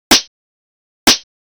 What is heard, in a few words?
Add Snare B; Сэмплы ударных (Disco, House); 125BPM; Beat; Loops; Барабаны; Ударные